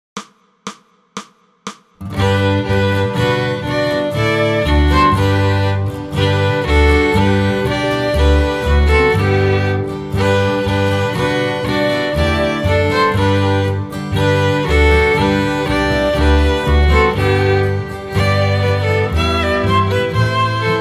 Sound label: Bass; Angels We Have Heard on High; Holiday Arrangements